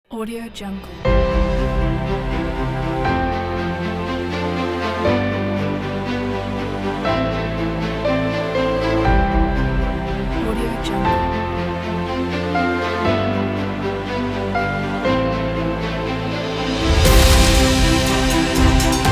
inspiring orchestra

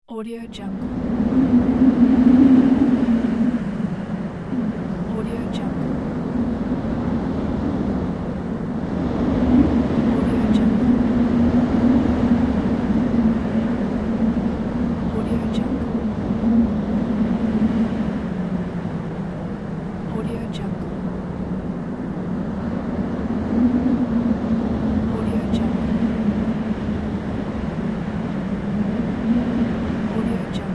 wind atmosphere

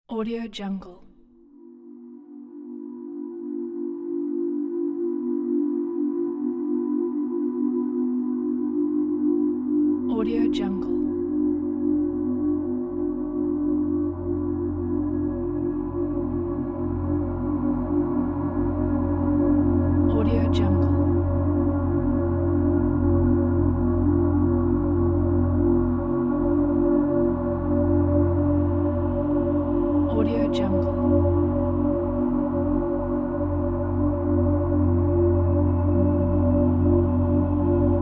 suspenseful dark ambiences

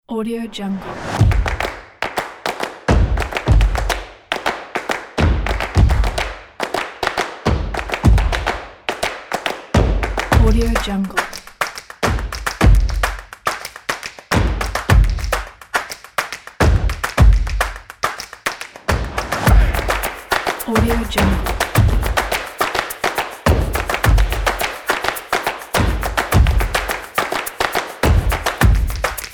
stomps in the city

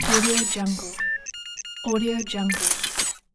system activation

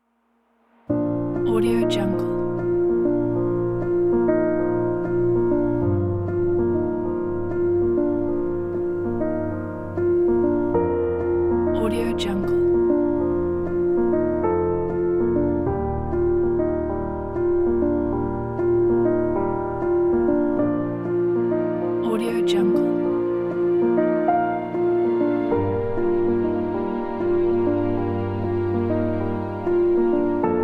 inspiring cinematic piano pack

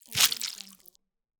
flesh crush squelch

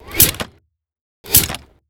utilities rifle mechanisms

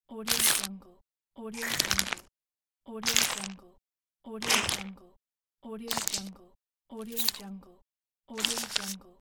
insect crush; warpjumper

